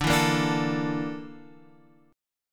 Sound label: A sharp Major 7th Flat 5th D